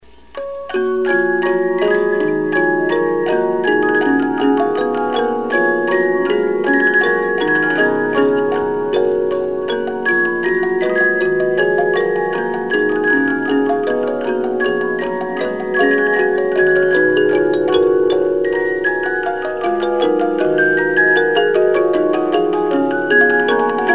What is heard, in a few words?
Minuet, 72-Note Movements, music box; Eine Kleine Nachtmusik